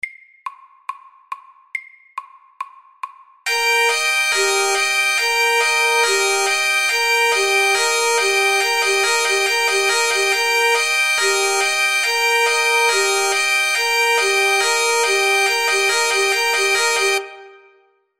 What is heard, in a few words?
Alarm FORT